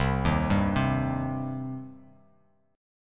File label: C Minor C down Bass Standard; arpeggiated; JGuitar